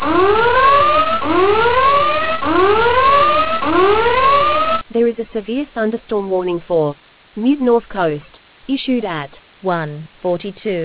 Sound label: storm audio